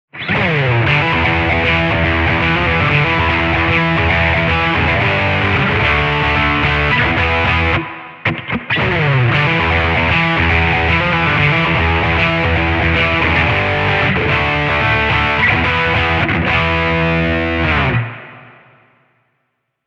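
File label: orange stack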